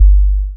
Bass Drop

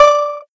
soft hit whistle; osu

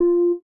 bass F

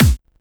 soft hit whistle; osu

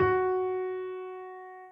soft hitwhistle

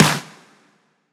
clap snare

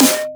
snare

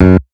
bass staccato; osu

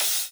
crash cut

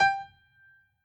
piano hi

